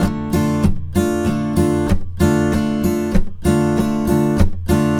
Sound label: riff 96, Blues Guitar; ILIO; Fretworks